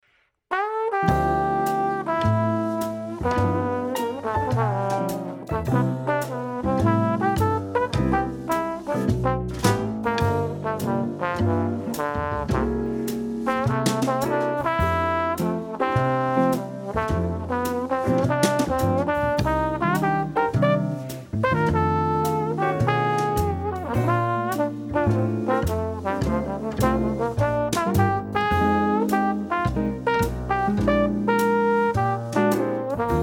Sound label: Improv on ii V I cycle